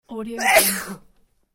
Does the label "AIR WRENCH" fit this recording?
No